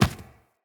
Inf Medium Run Default, Footsteps, Enemies, Infested